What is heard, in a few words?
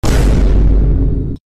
dun sound fx; kracc bacc